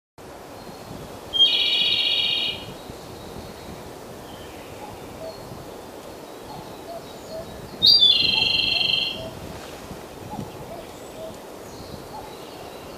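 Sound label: Japanese robin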